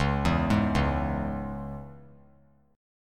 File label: C sharp Minor, chord; C#m; arpeggiated; JGuitar